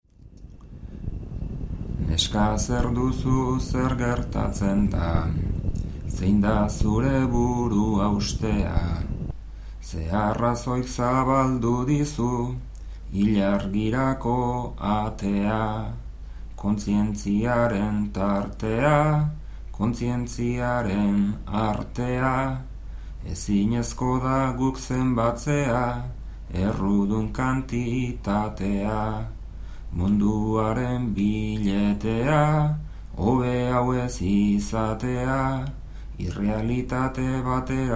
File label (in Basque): bertsos